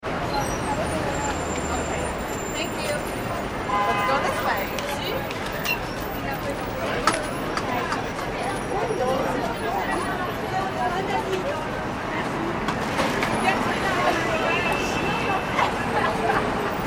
ambience urban city; New York City Street Ambience ( Times Square ) 7pm - Traffic And Pedestrians Close - By An; nyc times sq

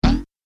Arcade Game Jump Bounce, retro; Video Game Sound Effects

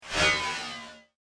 Cool As Ice Sci Fi Sweep, whoosh

